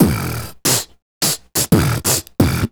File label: Beat Box, Hip-Hop and RnB Vocals